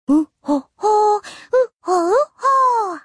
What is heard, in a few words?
ooh ohh